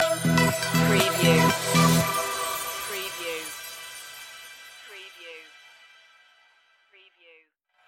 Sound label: firebirds stinger